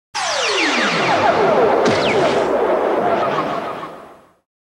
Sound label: Commercial Bumper Sting